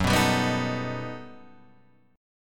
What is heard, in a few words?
G Augmented Major 9th